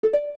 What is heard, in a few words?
Mini Map On; Toggling the Mini-Map ('Off' is also used to toggle first-person view)